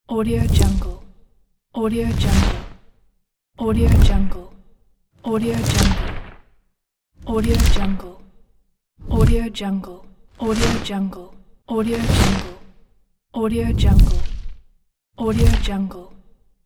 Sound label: fire whoosh